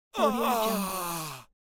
male groan pain

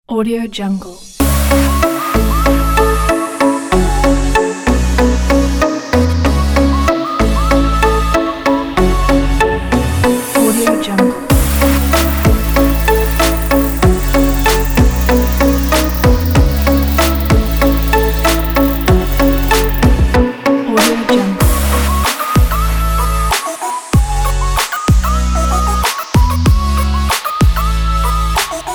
fun background

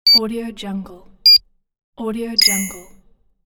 beep

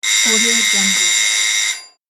doorbell ring

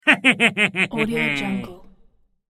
creepy laugh

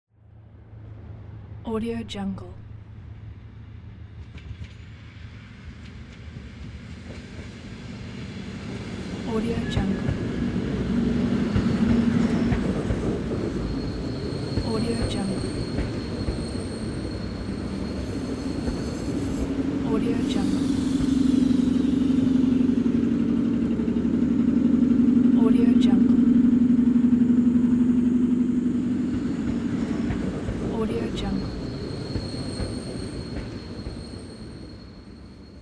train

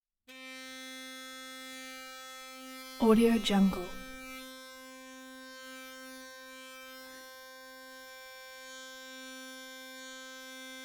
sonicare toothbrush vibrating electric turn on

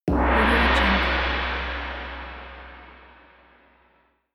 gong

